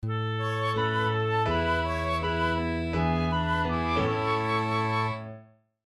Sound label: Childrens music end